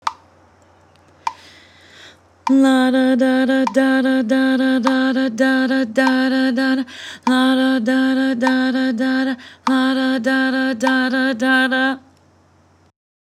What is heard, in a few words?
16th Notes La Da